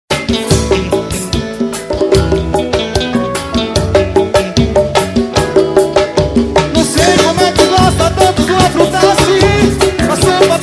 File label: groove